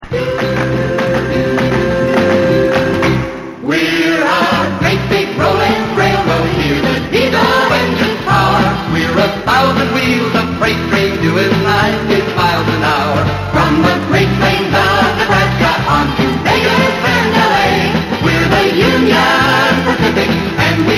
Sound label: ringtone